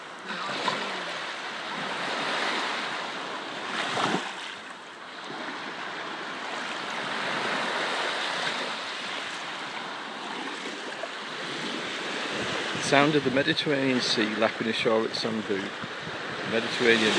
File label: med beach; son bou